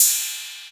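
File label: cymbal 808, PERC SET 808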